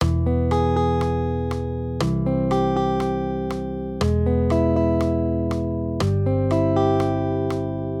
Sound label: maj triads